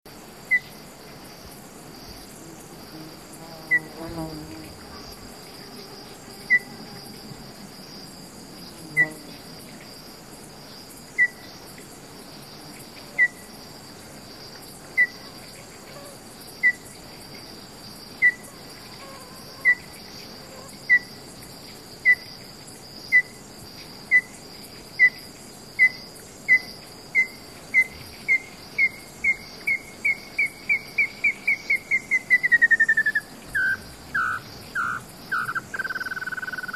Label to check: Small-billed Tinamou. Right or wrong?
Right